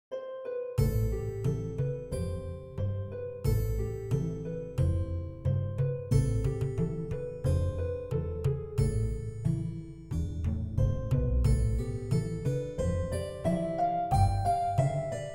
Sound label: Forest Theme; Ultima VI